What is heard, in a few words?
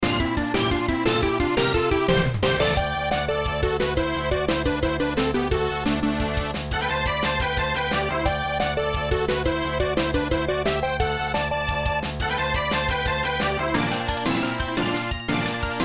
Victory!; Naruto: Path of the Ninja